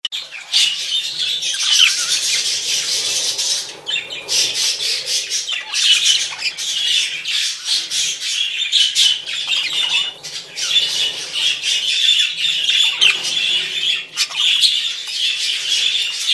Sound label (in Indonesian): suara parkit rombongan